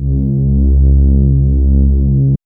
MOOG; AMG - Vince Clarke Lucky Bastard

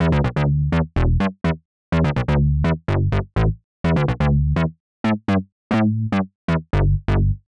Synth Bass E, 125; PowerFX - Nu Jazz House

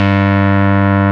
rave beep G1; Best Service Alpha Dance vol.2

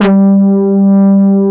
HO G2, ANA HRN

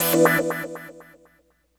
Progressive Synth, Trance

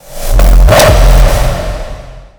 raw fx kick

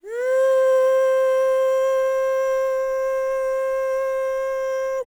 HUMAN TRPT, Jazz FX; Spectrasonics; Vocal Planet

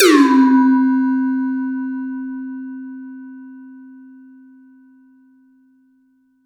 Perc

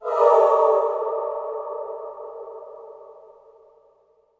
IMPACT SCARY, Cinematic Impact

